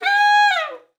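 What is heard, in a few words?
slide G#5, saxophone; Best Service ProSamples vol.42 - Session Instruments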